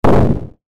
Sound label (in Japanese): 爆発音 短め